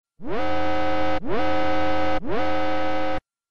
Warning; Utsuhos

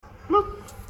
bloop; Raymond holt

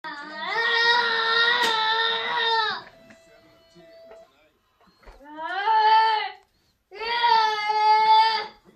Roaring

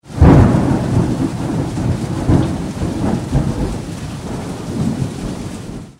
Thunderstorm

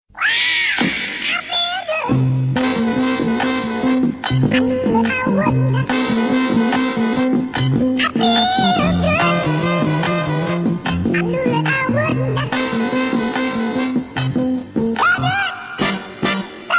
Funky

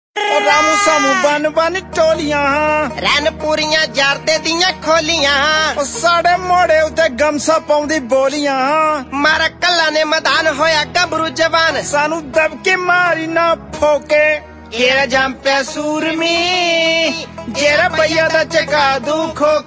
Comedy Tone

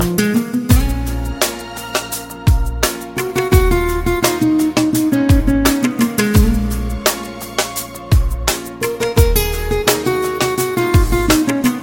Guitar

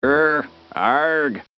Grr Argh

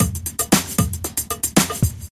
OldSkool

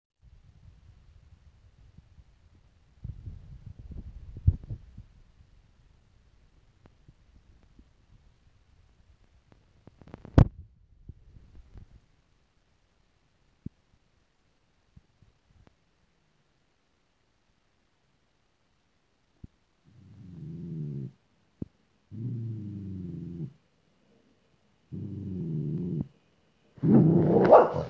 Grumbling

